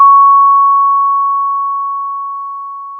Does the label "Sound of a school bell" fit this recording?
Yes